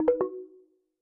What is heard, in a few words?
calls you joined call; Slack; macos